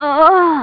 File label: DYING; BattleSNDS